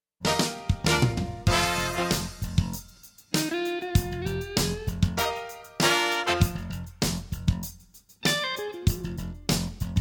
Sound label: Bluesy